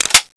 clip out; tfa cso; dart pistol

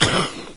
maggot melee; doom3

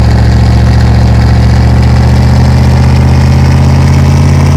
first, vehicles cars; quadbike; lwcars

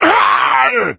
zombie pain

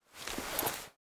weapon holster

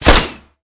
bow fire; bow deerhunter

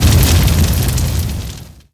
sparks

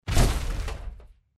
walk, power armor; clothing system

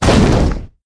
jump

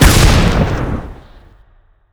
explode

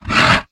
vox attack

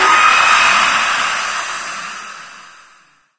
death, lostsoul; doom3

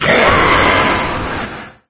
death, wraith; doom3

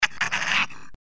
giant rat attack; npc

